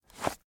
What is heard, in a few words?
pick up